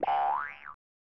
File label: Boing; Hanna-Barbera's Turbo Toons; snes